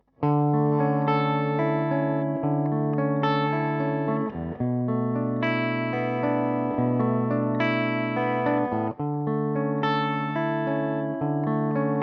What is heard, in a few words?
Clean